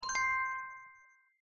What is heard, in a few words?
copyer complete; gardenbloom